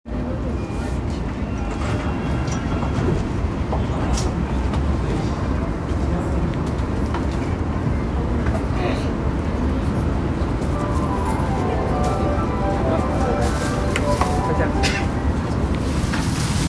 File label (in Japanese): 日比谷線神谷町北, train sounds